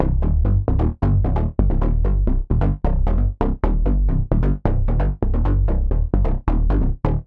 132 House Arp Cmaj; Dance DJ; Synth; Arps; Pro Tools Essential Loops; Digidesign

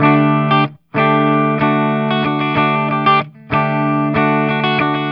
094 Pop Guitar Strum (Sus4) D maj, Electric; Pop Rock; Electric 055-099 bpm; Pro Tools Essential Loops; Digidesign

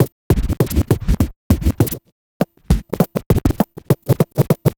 Vinyl Scratch Kick; Digidesign; Pro Tools; Pro Tools Essential Loops; Dance DJ

